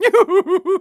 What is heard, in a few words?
LAUGH; The Wild Thornberrys Movie; DARWIN